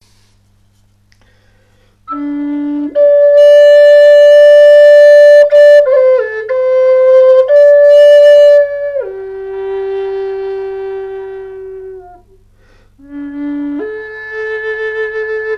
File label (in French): Son flute amerindienne re grave bambou